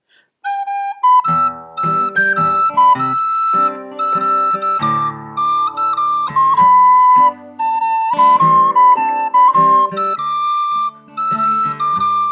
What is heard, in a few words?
mazurka